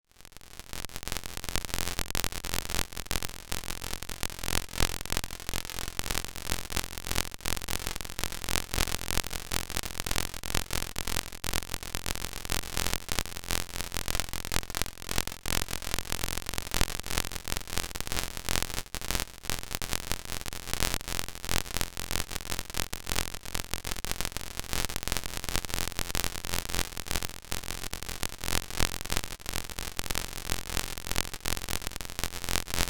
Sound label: crackle; Bluezone; Abandoned Labs Dark Cinematic Samples